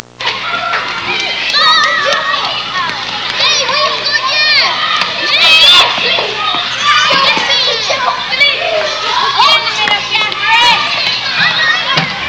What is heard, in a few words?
schoolyard, cartoon sfx